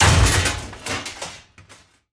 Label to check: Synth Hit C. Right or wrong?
Wrong